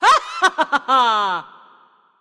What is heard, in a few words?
Sinister female laughs at her victim. Human, Evil Laugh Spooky, Sinister Laugh, Female